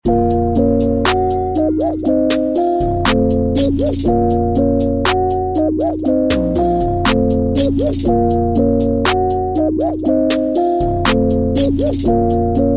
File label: start action, music